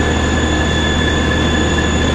Sound Electrocar; car simulator 2

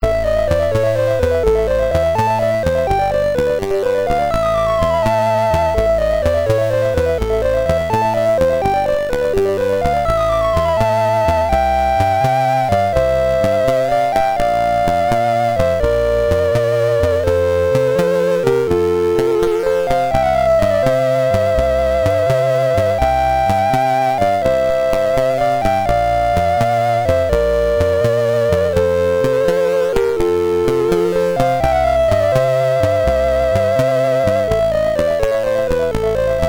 Boss fight; Space Harrier; Commodore 64

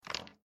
Place Objects

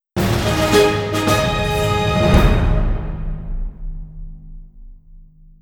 All Coins Collected

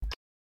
Chip Unpressed; my cute commissar 2020 gamerip

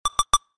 Helicopter Target

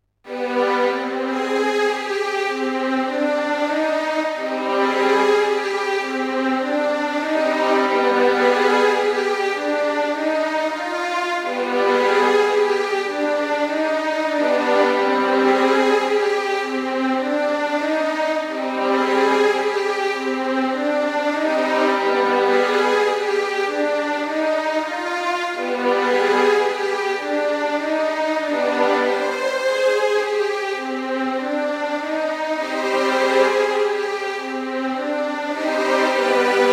variations (violin section); max payne; Max Payne 2

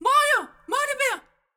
Death Vo

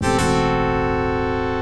Unused Fanfare; Gambler Jiko Chuushinha Katayama Masayuki no Mahjong Doujou; Genesis

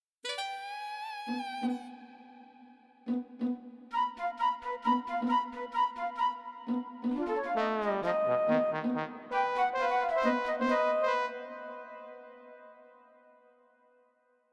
Jungbeat

